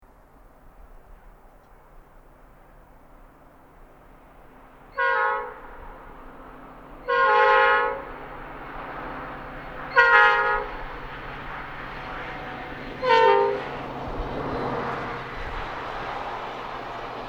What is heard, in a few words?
horns; p5a